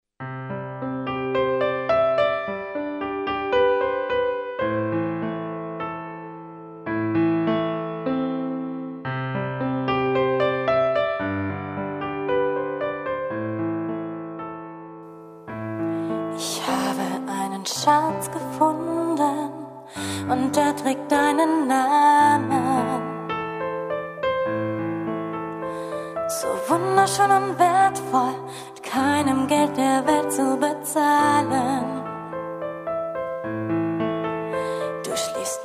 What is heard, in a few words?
Piano